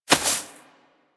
Rodent Hop Step Rear Grass, Forest Rodent Foley Footsteps; Characters; Warframe